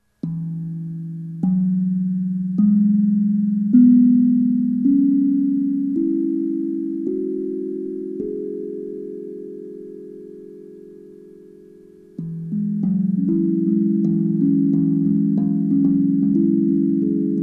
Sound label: hapi E maj